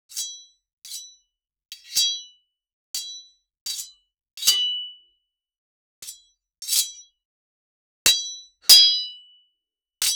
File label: a sound of swords; battle